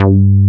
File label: TEK G3, TEK BASS; Drums In Your FACE!